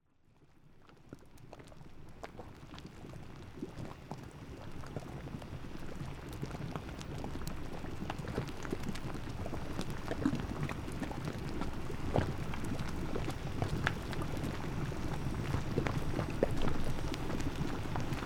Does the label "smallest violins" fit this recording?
No